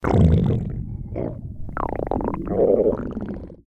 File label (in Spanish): Tripas largo